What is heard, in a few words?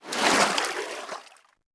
wade, Footsteps, Water